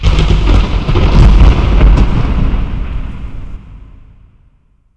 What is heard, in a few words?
Thunder; The Elder Scrolls III - Morrowind